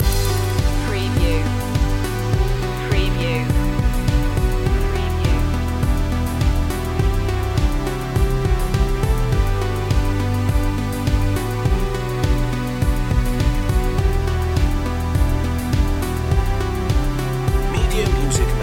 deep dreaming loop

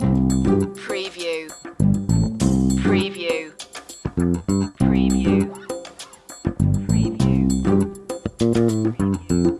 beat vouyer loop

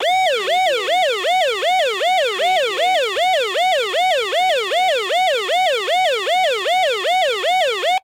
Electronic Siren

